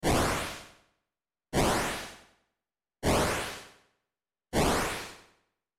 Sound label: flamenco tail slap